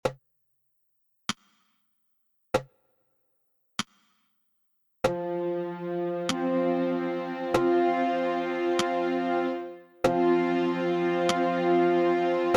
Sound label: tenor with click